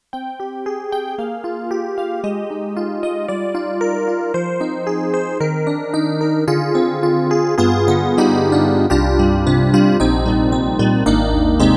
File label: Yamaha MU1000EX Chor EP2